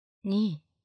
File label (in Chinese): 骰子, ASMR